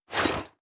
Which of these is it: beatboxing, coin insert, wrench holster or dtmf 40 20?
wrench holster